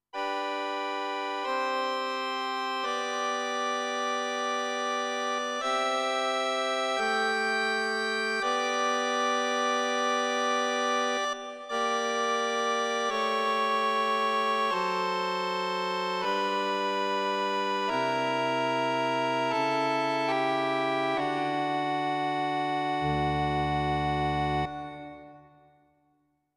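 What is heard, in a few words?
Reed Organ, digital piano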